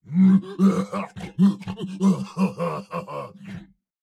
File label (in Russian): Смех; Турбосвин